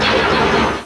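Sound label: turret fire ambience; star wars trench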